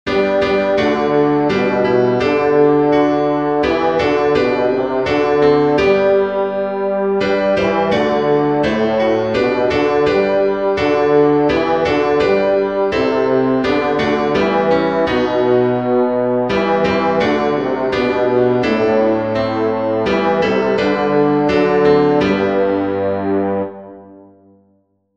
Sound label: bass; Jesus, Lead the Way